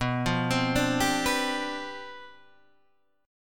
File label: C Major 7th Suspended 2nd Suspended 4th B, chord